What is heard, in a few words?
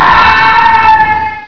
scream; HacX